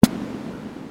SD, vermona drm1

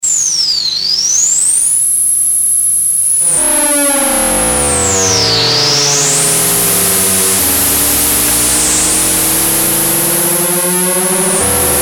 Ringmodulator; tinysizer

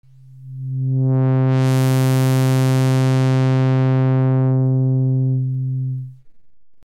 lpf no reso, synthesizer basics

